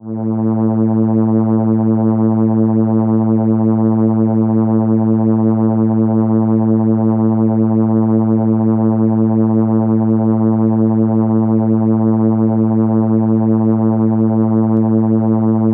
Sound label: Cello A3, ARP Solina